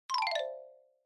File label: Xylophone, Fast Short Down